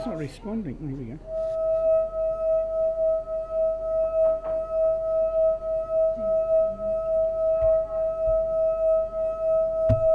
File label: singing wine glass; claudia